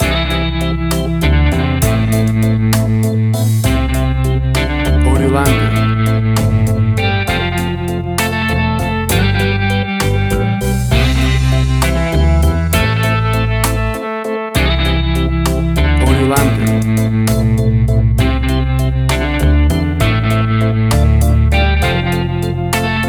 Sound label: 50s School Prom Slowdance